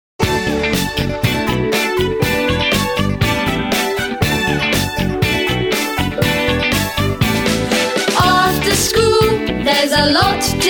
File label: karaoke